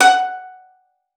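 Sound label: violin pizzicato F#5; Xsample VOL-5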